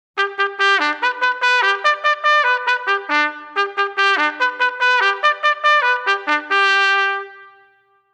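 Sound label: General Quarters, bugle call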